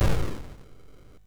MOS6581R2, sid; Defenders of the Earth

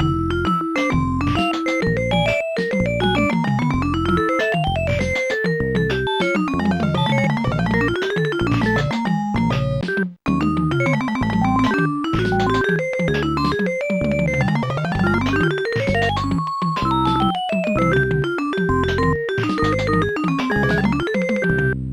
jeneric jazz; Instant Music

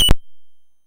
MOS6581R2, sid; Future Knight

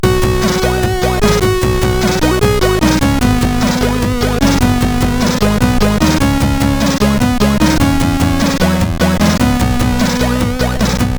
sid CSG8580R5

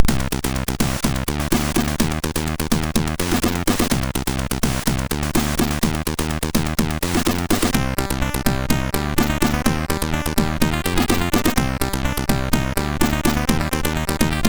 Butt Slap, MOS6581R3

